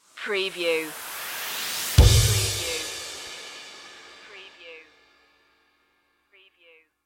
going the distance stinger

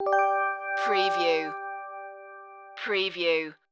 idents ident

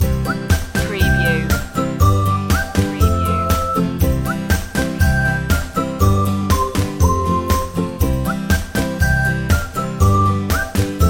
whistle happy loop

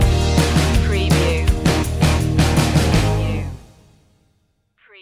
breakin waves stinger

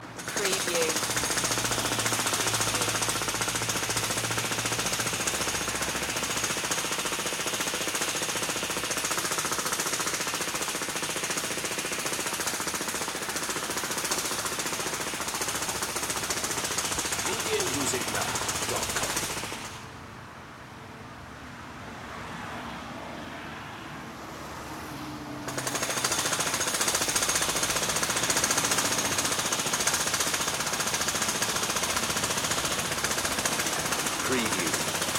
construction site hammering loop

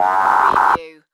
Alien Transmission; sound effects bible

